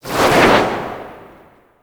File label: Royal Guard Jumping Smash Launch; Warframe; Grineer; Abilities